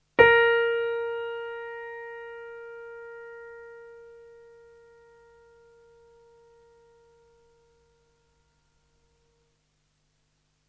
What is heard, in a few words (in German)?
Stimmton Trompete c'' (b')